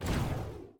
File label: missile plasma short; Mindustry